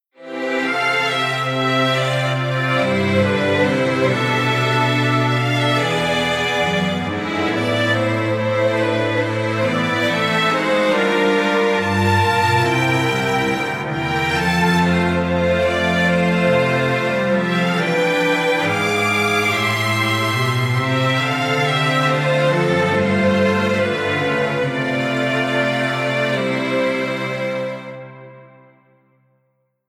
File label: Strings Poly; Sample Naisei